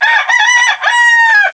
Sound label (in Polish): kogut